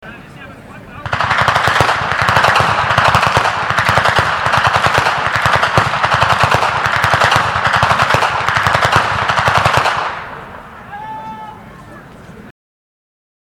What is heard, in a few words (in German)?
Schnalzen; Ainring; Ainringer Schnalzen